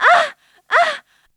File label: SEX; Zero G; Man Machine